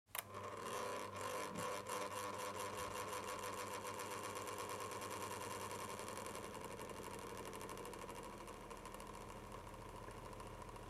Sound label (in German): Luefter